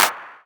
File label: CLAP, EMU Orbit-3 drum machine; 300 Drum Machines